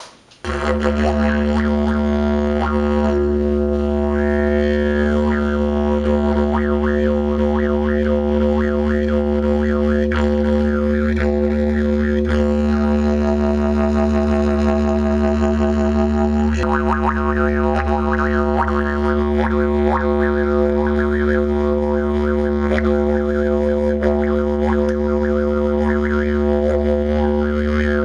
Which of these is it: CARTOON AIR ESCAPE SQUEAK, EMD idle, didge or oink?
didge